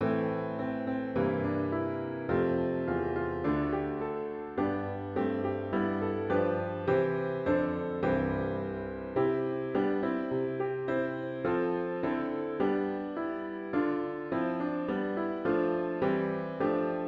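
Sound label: Hymn piano with Intro; Let Us Talents and Tongues Employ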